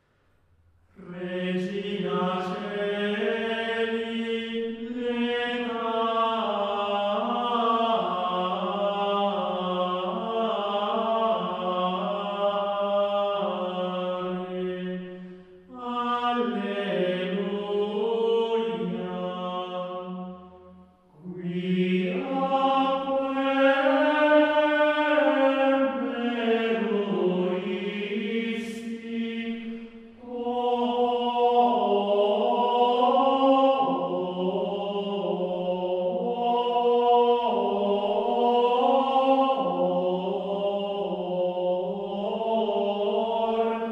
Regina Coeli, Canto Gregoriano; Mater Domini; Reggeli Musor szignaljai

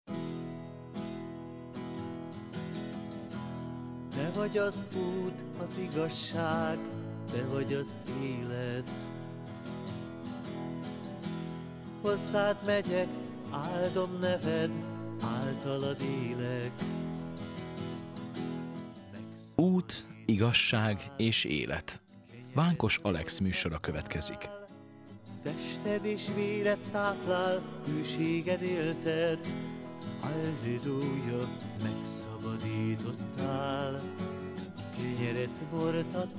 Jingle; Ut igazsag es elet

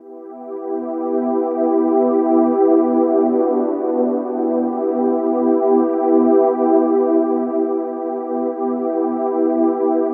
ambient

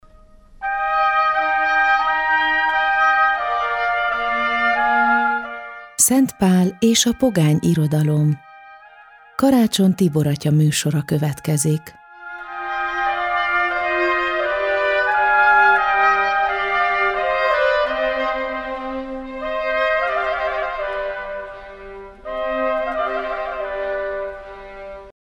JINGLE; Szent Pal es a pogany irodalom

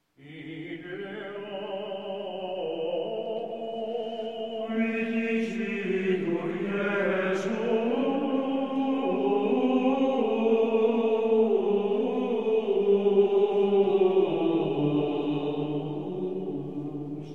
Ambrosianischer Choral, Gregorian chant; Missarum; Confractorium; Ille homo

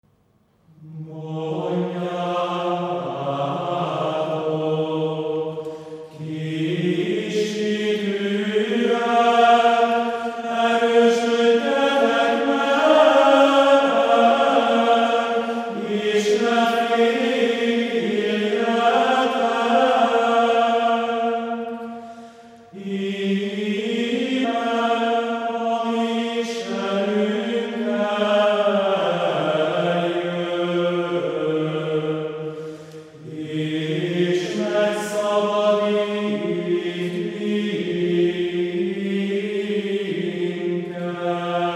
Gregorian chants; Advent 1; Adventi enekek

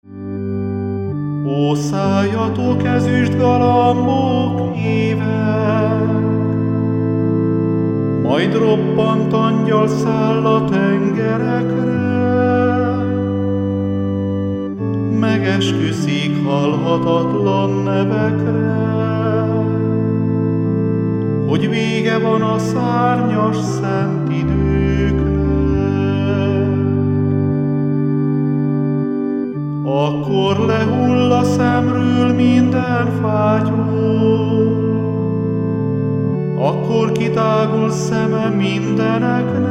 Jingle; O tette vallaimra szent palastjat

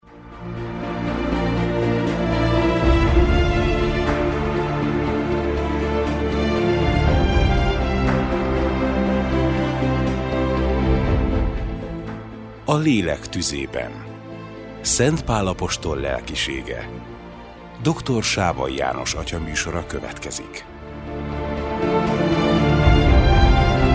JINGLE; A Lelek tuzeben

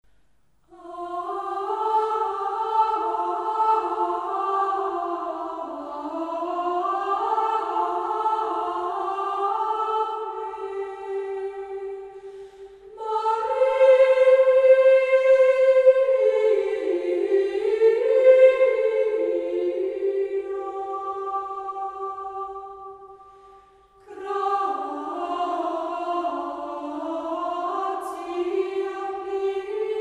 schola - Ave maria (gregoriano); Adventi enekek

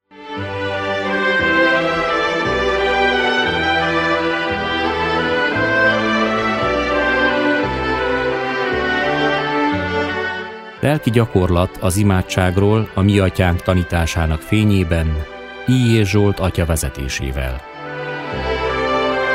JINGLE; Lelkigyakorlat az imadsagrol; a Miatyank tanitasanak fenyeben

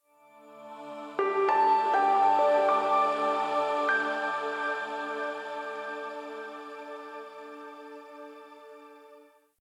piano logo; LOOPS

